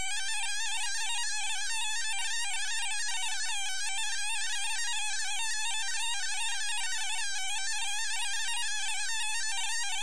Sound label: dx7 mosquito; Electro and Synthetic